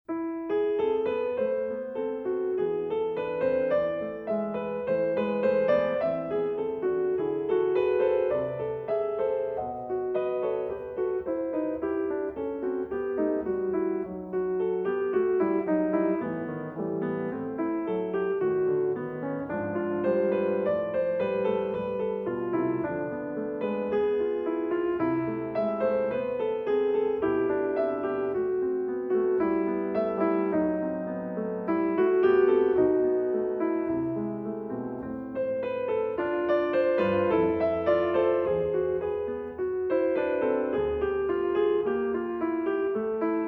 chorale prelude

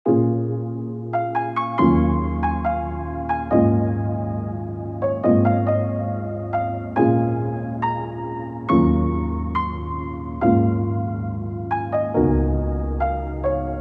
KEYS Upright Piano C; Cymatics

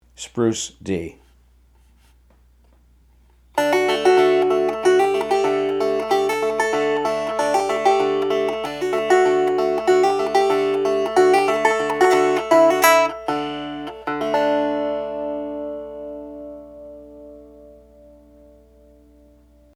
Fingerpicked Spruce D Grand

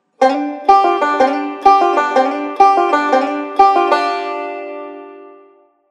Guitar Lick

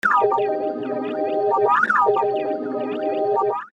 Pedal

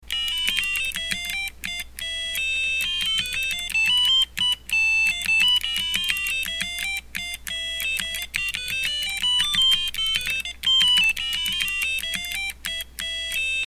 music

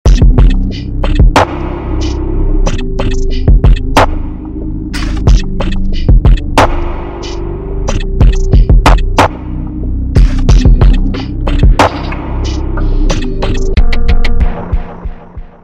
Drum Kit; Onlyxne; Balenciaga Vol. 1

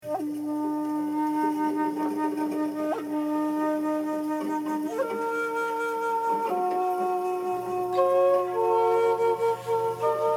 music grove inside outside

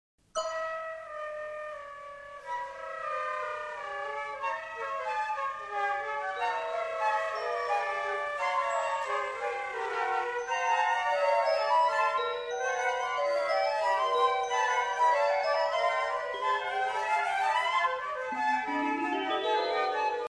Champagne Flutes

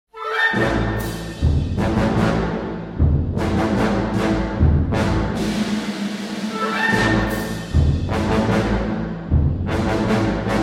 Fanfare concert band

